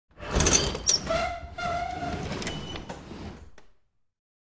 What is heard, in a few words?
Cabinet Drawer Antique Open